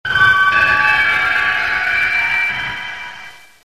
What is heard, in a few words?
Castle Ambience; dragon lore